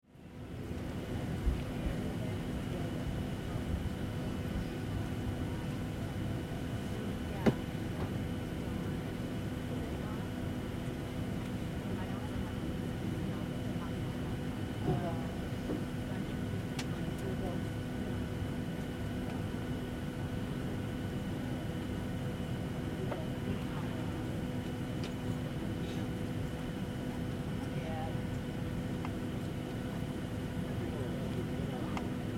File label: Airplane Deboarding Interior Commercial Airline; Vehicle; Airplanes